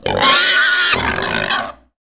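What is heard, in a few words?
gamorrean dead; Star Wars Knights Of The Old Republic I; XBOX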